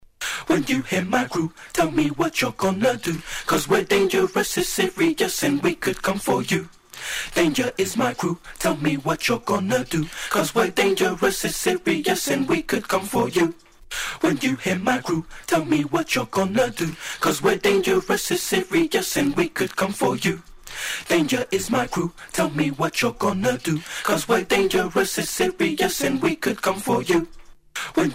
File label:  acapella